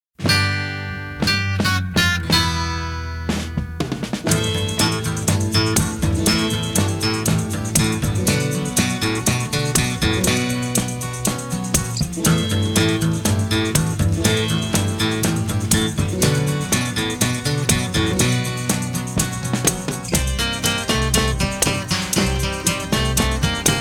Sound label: instrumental